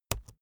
keyboard laptop enter press slow hard

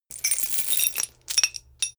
metal chain pull up

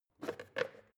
plastic water boiler lid off soft; maschinen; wasserkocher; soundpool

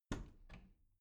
bathroom cabinet door open soft

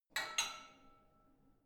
barbell only lift up soft, fitnessstudio

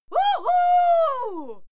female cheer wuhu

